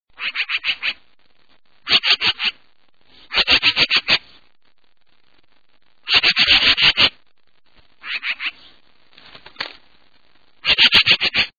Black billed Magpie

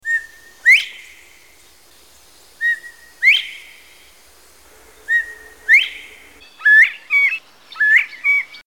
Northern Bobwhite, songs

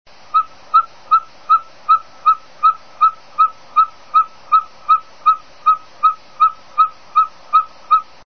Ferruginous Pygmy Owl, songs

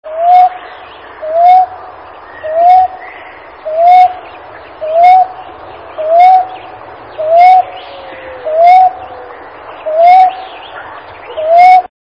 Common Ground Dove

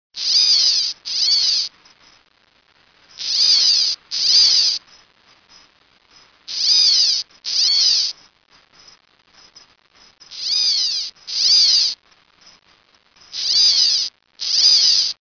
California Gnatcatcher, songs

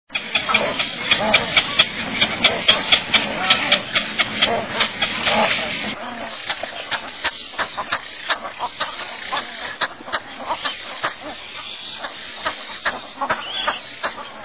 Cattle Egret

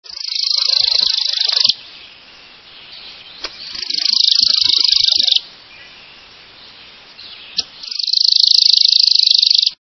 Worm eating Warbler, songs

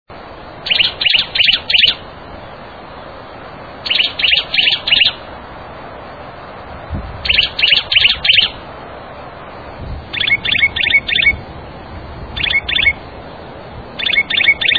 Juniper Titmouse, songs

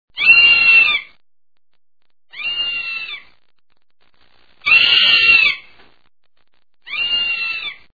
Ferruginous Hawk, songs

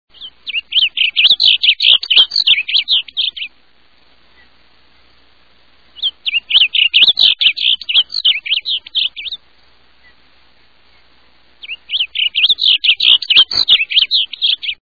Blue Grosbeak

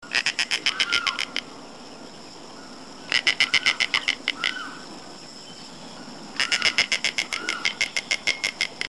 Snail Kite

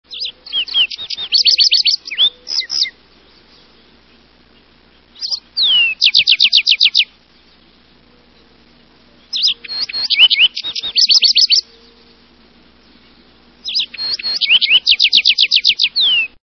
Lark Sparrow

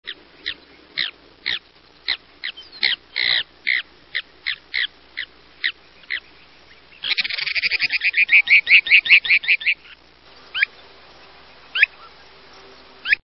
Mountain Plover

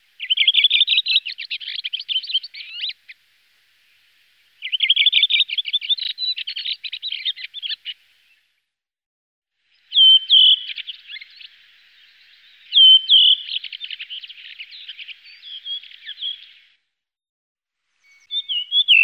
Redwing

